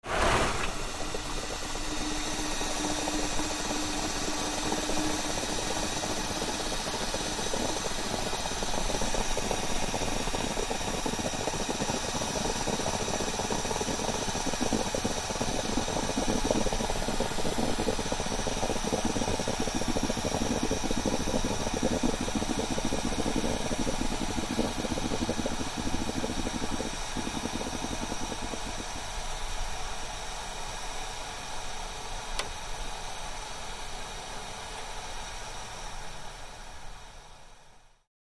Car Radiator; Boils And Hisses Steady, Close Perspective